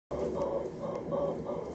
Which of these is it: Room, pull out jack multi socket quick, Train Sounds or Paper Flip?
Train Sounds